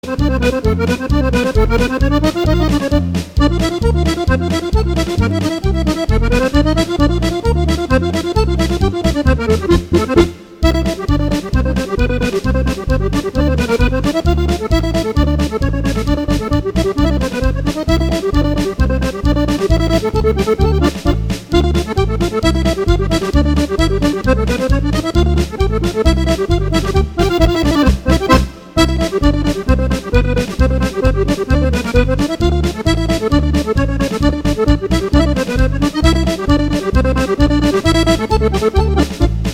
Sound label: Polka